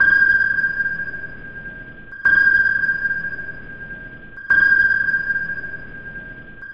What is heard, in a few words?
Sonar Ping Repeat